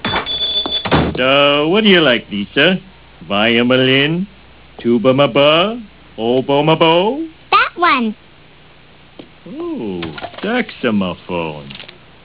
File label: saxomophone